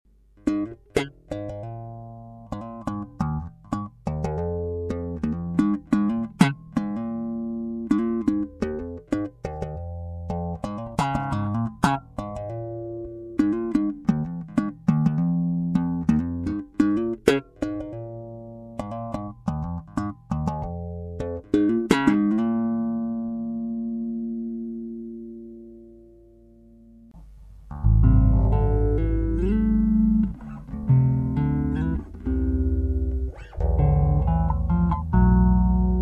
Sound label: mxr phase 90 bass; mxr phase 90 history